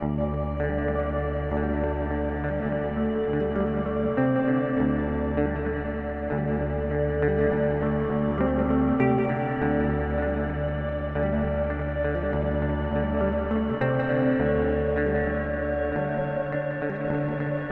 TC Electronic Hall of Fame 2 x4 Lofi

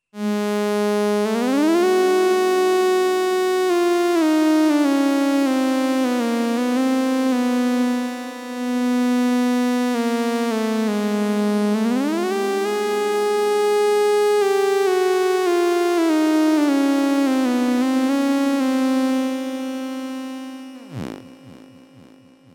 moog theremini long echoes chromatic

